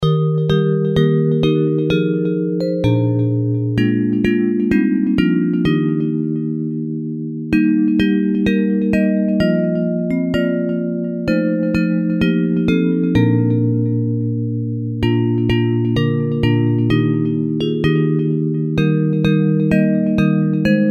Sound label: Bells